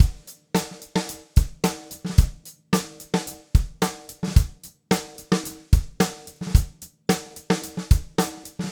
Black Maple Groove 110, DW Black Maple Kit, 110 BPM, Drums; Sonic Foundry (Sony Creative Software); Vital Drums